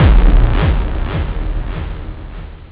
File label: kick